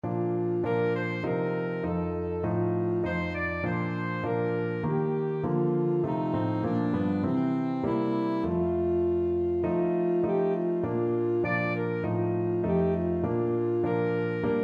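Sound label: alto saxophone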